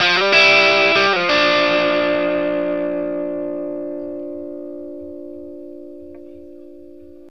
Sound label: BLUESY G 60, Vintage Blues Guitar; AKAI; USB Soundscan vol.22